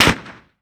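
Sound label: impact explosive round sweetener; Modern Warfare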